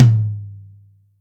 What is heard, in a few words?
Dry Mid, Acoustic Tom; Yamaha DTXpress